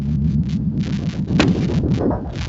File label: Humm